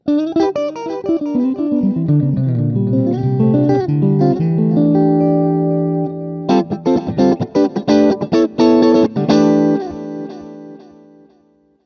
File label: Delay Sample